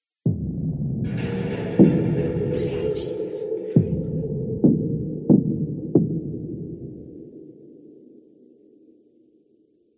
percussions effets

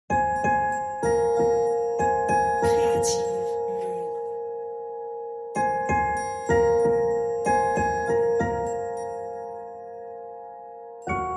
Frozen Landscape piano préparé

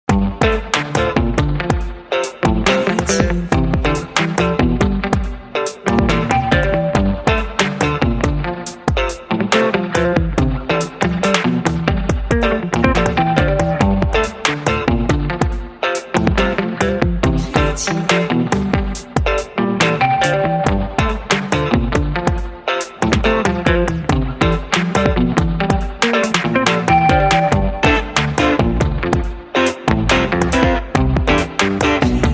Groovy Ride electronic

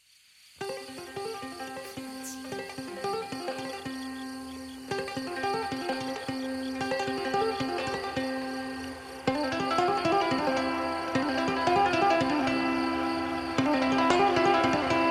Prairies Ukulele Sound Design; aventure epique

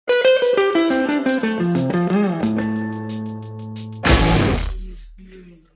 Hammer on Foot heavy

